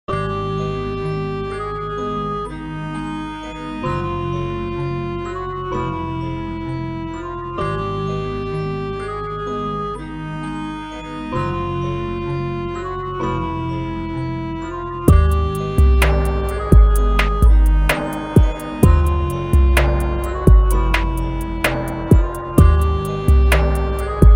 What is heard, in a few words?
la polka